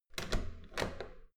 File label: metal door heavy handle use